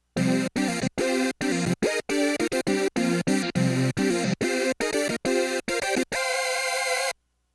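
Sus4 Stack, Sequential Prophet '08, factory program; Spit n' Spank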